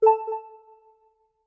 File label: Low Health; Low health beep; Skyward Sword; zelda